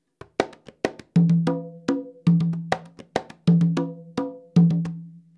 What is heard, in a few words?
guanganco, congas